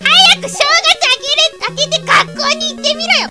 kid

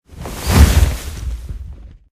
parachute; sven; tower

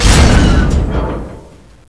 crash fence, ambient; wartso

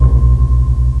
train

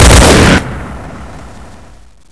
barrel, weapons

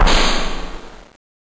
freight stop

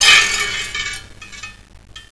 bust glass, debris

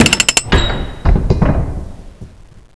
button

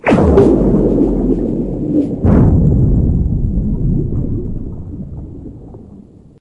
slosh mass

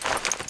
dual de deploy, weapons

